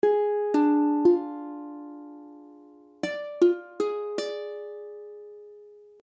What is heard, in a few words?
Ddim, ukulele; ukulele akordy